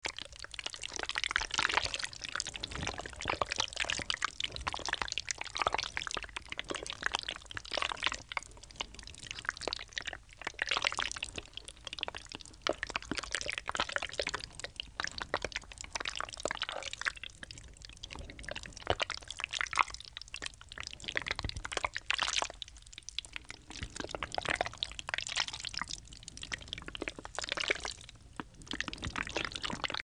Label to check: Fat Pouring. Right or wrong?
Right